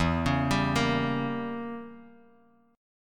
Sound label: E Diminished 7th E, Guitar DADGAD